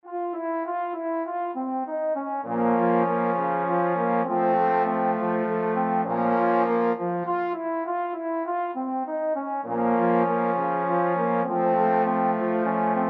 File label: fur elise, trombone quartet